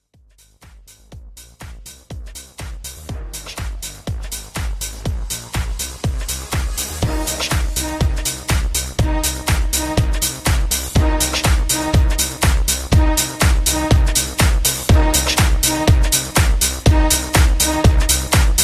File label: House Mix 122 BPM